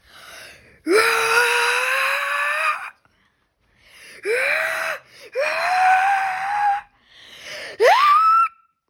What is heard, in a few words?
anime power up